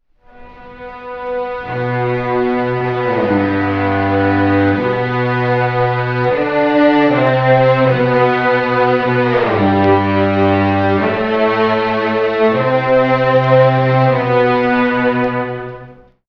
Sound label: strings, Sad Loops